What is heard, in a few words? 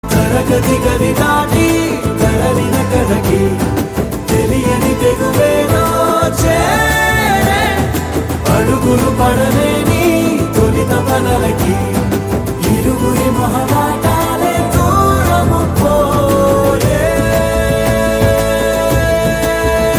background music